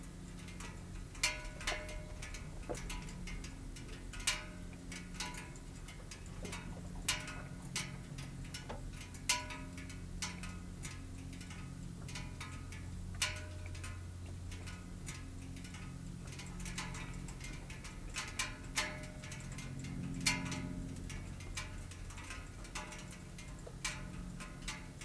Muscle Car Engine Cooling; Vehicles; Cars & Trucks

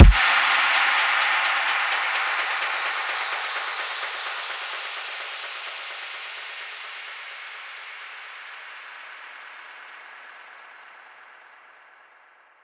Impact, Dubstep, FX; Cymatics; Dubstep Starter Pack

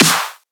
Riddim Snare Clap

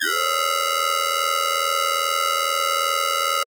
Riddim Bass Hit High Layer; One-Shots; Bass Hits; Ghosthack; Riddim Essentials Volume 2

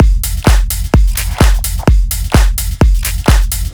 Loop - 128 BPM E, Full Drum Loops; House; Madagascar; Cymatics

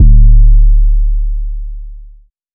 808 D; 808s; Cymatics; Cobra Hip Hop Sample Pack

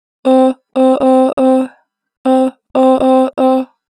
Oh, Harmor Vocals; 2 bar flat; Pitch Flat

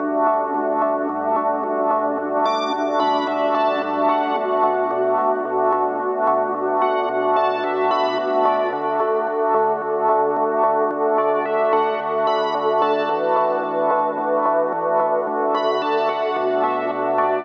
Melody Loop - 110 BPM A Min, Synth; Cymatics; Light Free Sample Pack; Bonus Samples

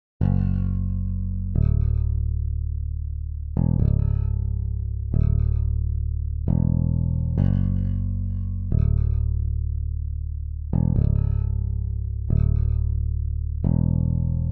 134 BPM F# Min Bass; Cymatics - 2022 Melody USB Expansion; Infinite

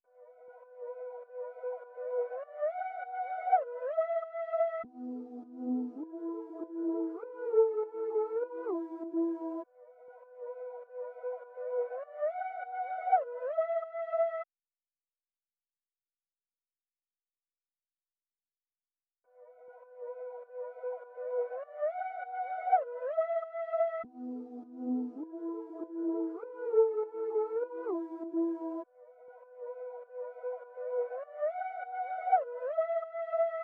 100 BPM E Min Reverse Synth, Afrobeats; Melodies; Loop Stems; Cymatics; GHOST (Beta Pack); Alora

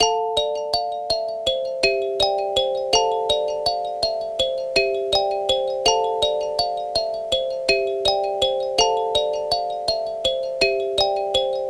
Bell, 164 BPM A Min; Cymatics; Holy Lands

